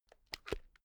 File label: spectacles case open hard